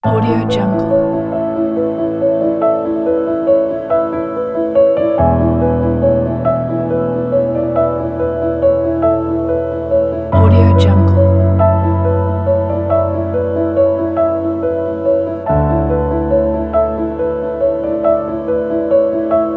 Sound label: piano memories